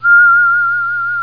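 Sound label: Human Whistle High; Instruments; Sound Library 2000 - Wayzata Technology (1995)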